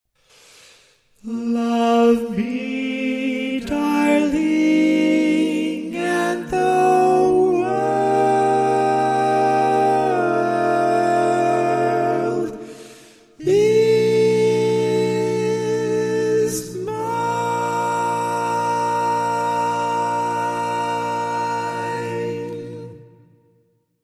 Love Me Darling, Tenor, Barbershop; Old standard version

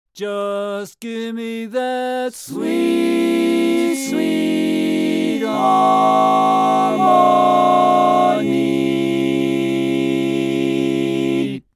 Sweet Sweet Harmony, Tenor, Barbershop

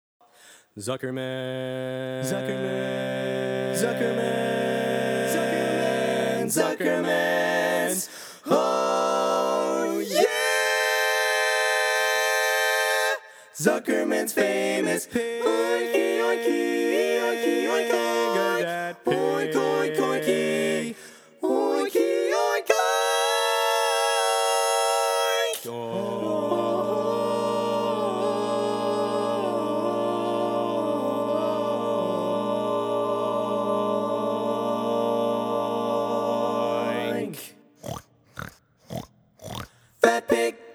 Lead, barbershop

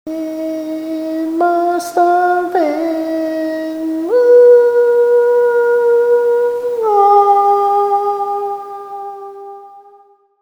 Barbershop Tags

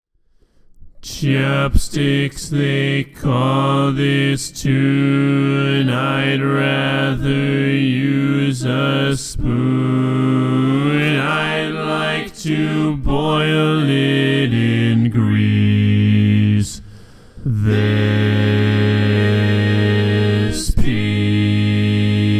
bass

